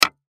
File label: Hammer Nail Hit Wood; Hammers; Tools; Industry; Sound Effects:Tools; Blastwave